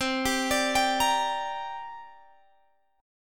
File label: C 7th Suspended 2nd, Guitar, Open G